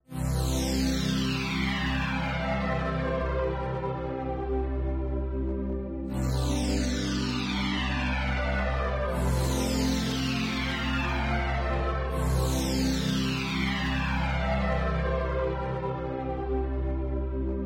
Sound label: PAD Spectrum Sweep; Synthelium; syntheway